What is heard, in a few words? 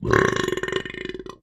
Belches Slow Very Low; belching